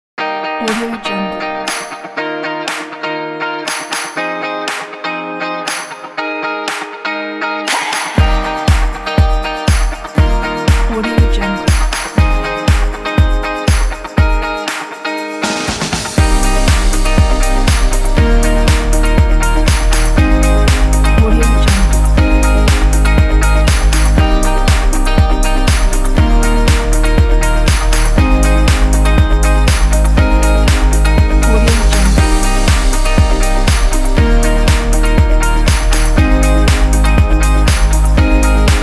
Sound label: upbeat and energetic inspiring pop